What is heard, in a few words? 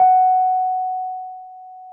Wurlitz EP F#; Sonic Reality - Vintage Timetraveler